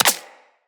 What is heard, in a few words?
Bullet Impact Flesh; Weapons; Bullet Impacts